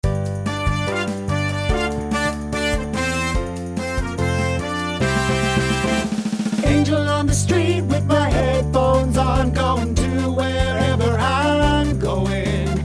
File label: Rehearsal Track